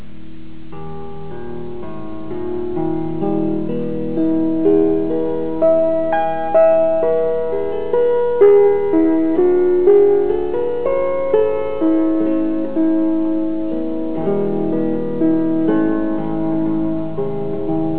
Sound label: tenora